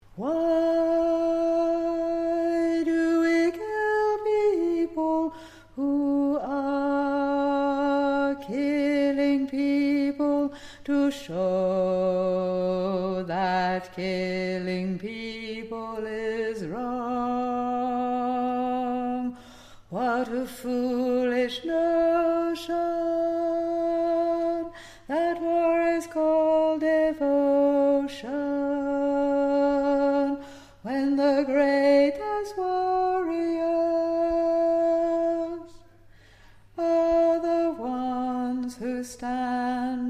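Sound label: Chorus Bass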